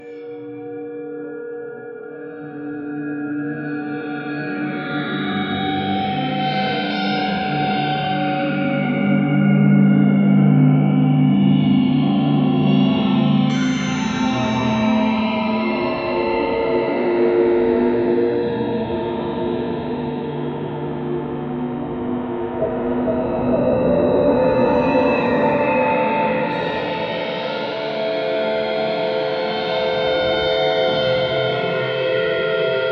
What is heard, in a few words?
light futuristic cyberpunk